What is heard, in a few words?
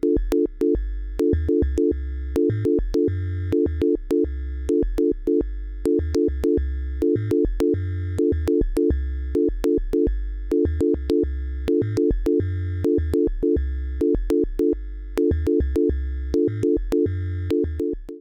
Waldorf 2 Pole Sine Pad Gate Trigger EnvMod